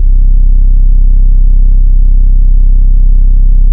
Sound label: Nasty 808